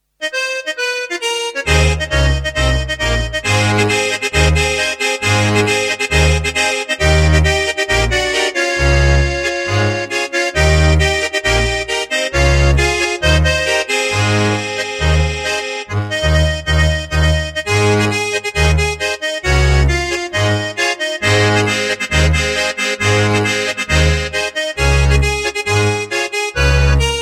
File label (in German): Zillertaler Hochzeitsblues Bass Variante